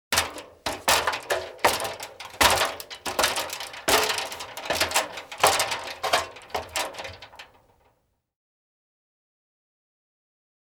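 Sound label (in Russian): поднимаются по стремянке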